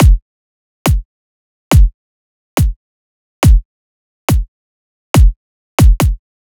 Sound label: Drum Loop 140 Kick; Ghosthack; Color Bass Essentials